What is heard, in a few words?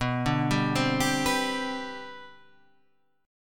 G Major Add 11th, B, Gadd11/B chord; arpeggiated; JGuitar